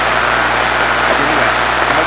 arab static

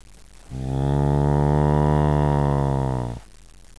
hummmmm, zombie; brainbread

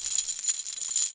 pkm belt out box; firearms

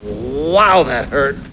wow hurt; svencoop

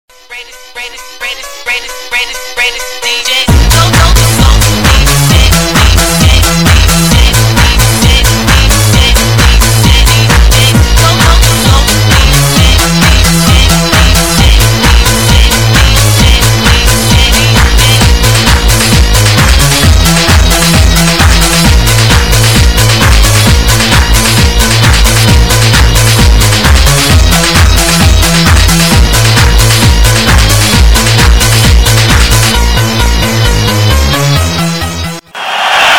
dance; svencoop

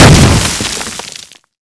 explode; svencoop

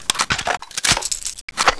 reload saw; gearbox; OPGrunt

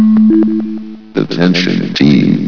team alert; svencoop; adamr

